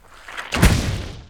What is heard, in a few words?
ALIEN IMPACT